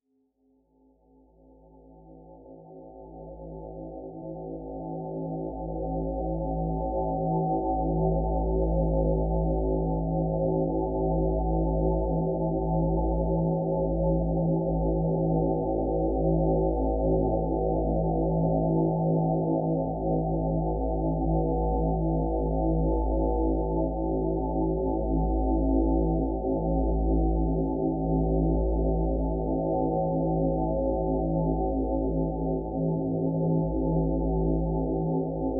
Heart Center ground & expand without verbal guidance, binaural; Meditation